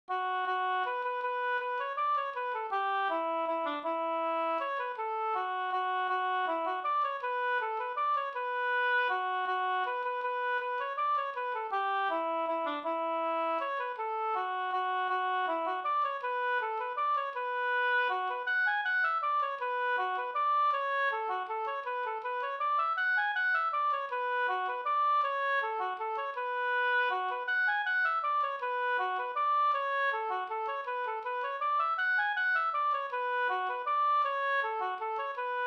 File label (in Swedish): vals H moll valsen